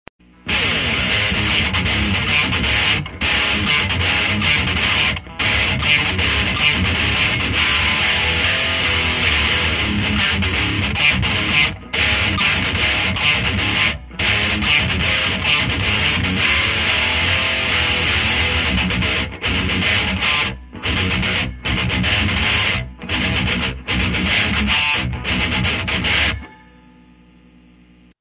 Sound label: Titan Extreme Scoop